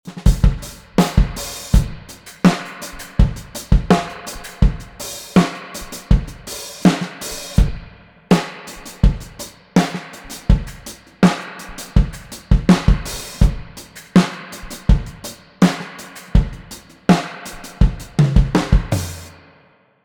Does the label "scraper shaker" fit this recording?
No